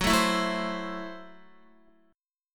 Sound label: F sharp Minor 7th Flat 5th F sharp Guitar; strummed; x,9,7,9,9,7